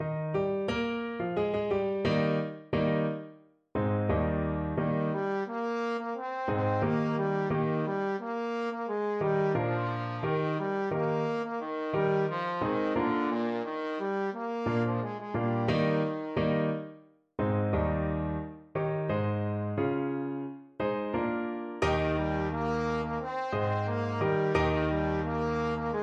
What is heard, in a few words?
trombone